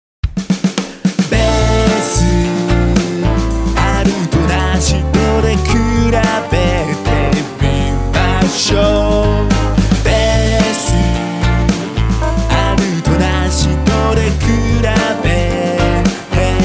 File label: bass